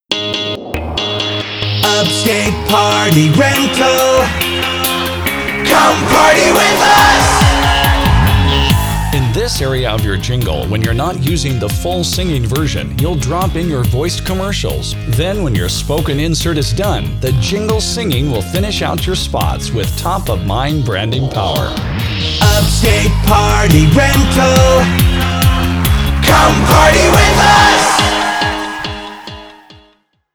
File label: Party Rental Jingle, party rental jingles; Upstate Party Rental